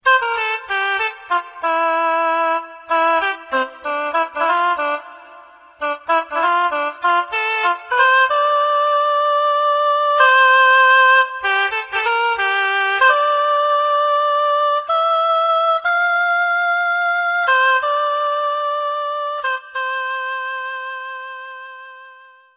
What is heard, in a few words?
instrument